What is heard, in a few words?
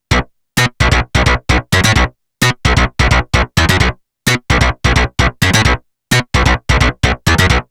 dx7ii